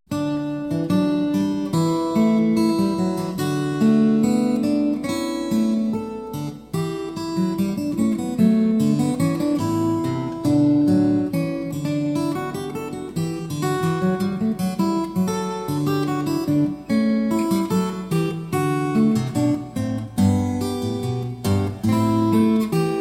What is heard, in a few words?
steel string guitar; Recercar 7; Paul Berget; The Siena Manuscript